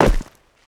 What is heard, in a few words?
land dirt, footsteps